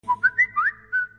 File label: SMS Tone